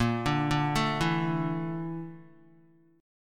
Bb Major 7th Flat 5th, Guitar; BbM7b5 arpeggiated; JGuitar

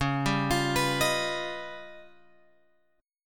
C sharp 9th Flat 5th C sharp, chord; C#9b5 Chord {9 10 X 10 12 11} arpeggiated

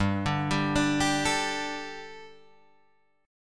G Suspended 2nd, Guitar, Drop D

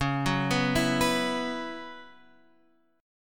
B Suspended 2nd Suspended 4th

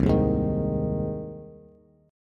C Minor Sharp 5th C down Bass; strummed; JGuitar

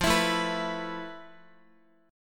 F Major Sharp 11th, Guitar; strummed

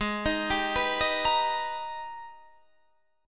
G sharp Minor Major 9th, Guitar, Standard; arpeggiated; JGuitar

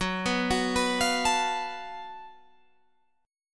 F sharp Minor Major 7th Double Flat 5th

